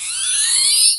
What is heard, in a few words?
soft camera flash sound; casual games